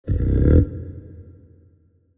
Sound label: kaiju adult pre chomp; biogenesis